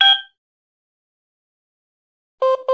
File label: Short Beep